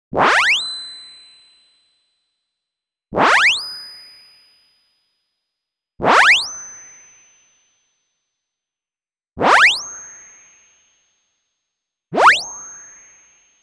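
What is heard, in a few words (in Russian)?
короткие спецэффекты накопления энергии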